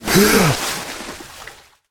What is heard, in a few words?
gasp